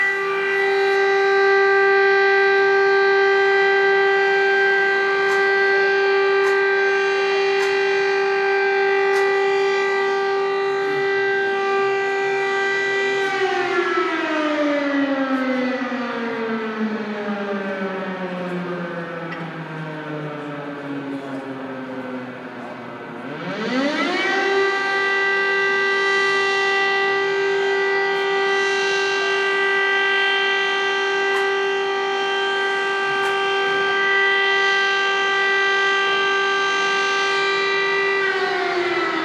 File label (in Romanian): alarma avertizare